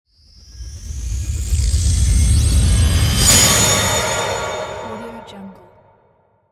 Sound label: horror movie buildup